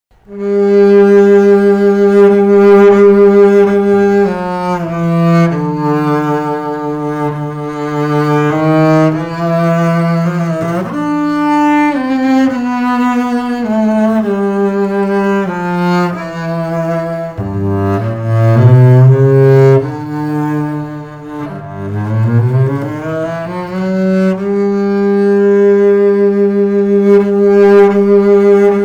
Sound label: antique double bass sound sample; French Mirecourt Double Bass by Jean Lavello Paris 1953